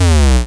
Synth Shot; Vengeance Samples

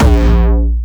A#1, Jumpstyle Kick; Bassdrums; Jumpstyle Multis; vengeance essential club sounds-3; VEC3 Bassdrums

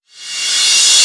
Cymbals Reverse; vengeance essential club sounds-3

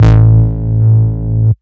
Bass Root A

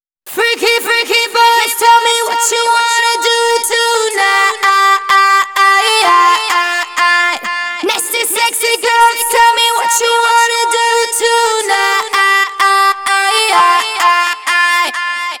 Female Vocals WET, 128 BPM; Vengeance Samples; Vocal-Hook Kits; Song Kit; Freaky Boys

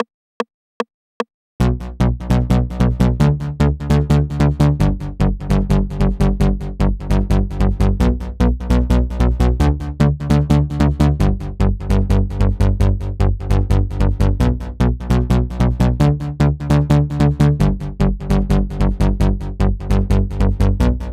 Bass, 150BPM; Vengeance Samples

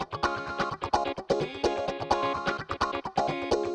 Electric Guitar - C# maj, 128BPM; Vengeance Samples; VEH3 Electric Guitar Kit 2

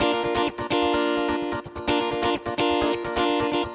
Electric Guitar 128BPM A# maj; Vengeance Samples; VEH3 Electric Guitar Kit 1

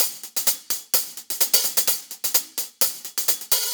Real Hihats, 128BPM; Vengeance Samples; VEH2 Loops

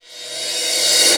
Reverse Crash, Cymbals; Vengeance Samples

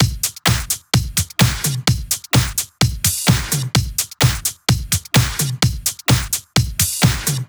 128BPM Kit; Vengeance Samples; Amplify Kit

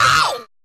Vocal Oneshots; Vengeance Samples; Vocals

